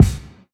Break kick; Kicks; drumkit

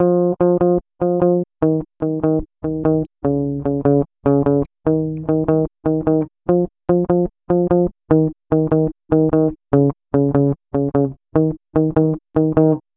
Lead guitar

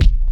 Boom-Bap Kick; Kicks; drumkit

